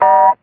dialtone, Synths; Notes

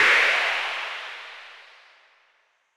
distant, Cymbals Electro

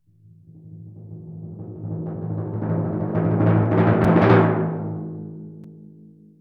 roiling, Misc Percussion Tympany

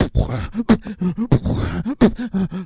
self, Beatbox, Drum loops

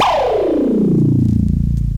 bowng, Zaps and Beeps

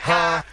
"huh"; Voice; ooh ahh

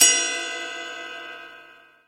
Real Open Hats

